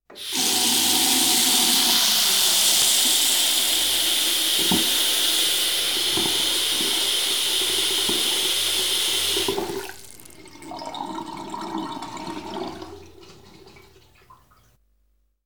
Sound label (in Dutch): Water In Goosteen; KMP Film Video Sound Effects